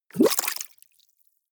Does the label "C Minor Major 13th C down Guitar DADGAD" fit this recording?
No